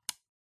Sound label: Clock Alarm (Toggle)